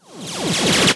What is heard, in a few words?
Sweeps Short